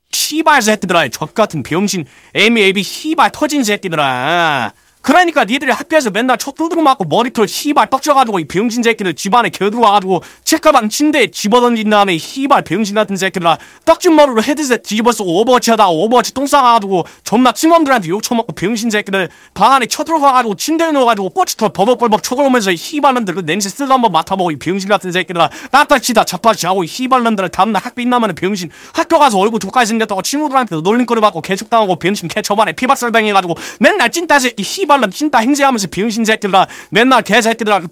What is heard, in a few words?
positive vocalization; voice packs; scout